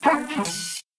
Cypig Collision

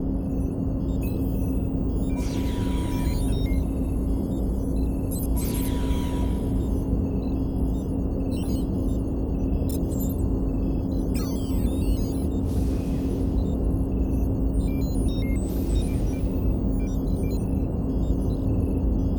briefing ambience

